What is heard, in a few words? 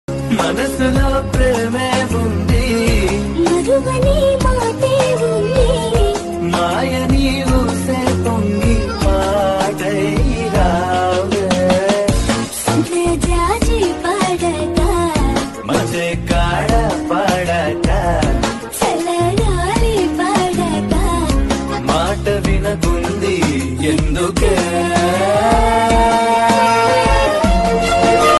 telugu love songs